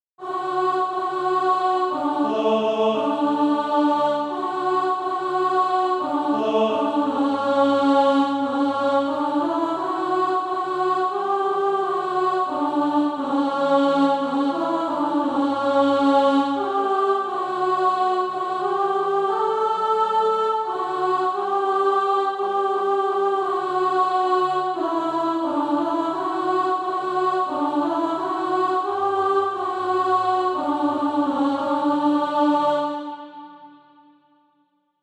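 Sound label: alto, SATB Practise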